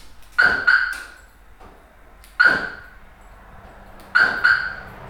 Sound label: car alarm arming in garage echo